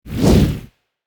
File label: fireball whoosh